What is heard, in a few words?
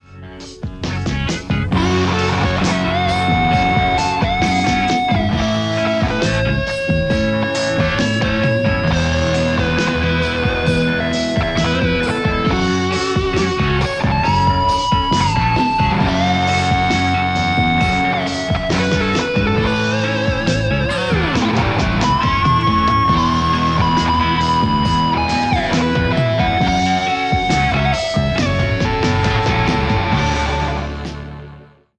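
Stratocaster; rcvprod amplistortion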